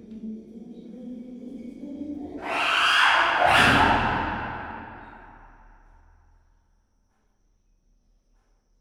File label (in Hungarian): indulatosan, csimpánz; gyori zoo 2014